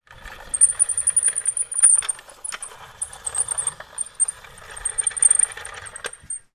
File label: toy car roll across carpet floor squeaky; sound effects one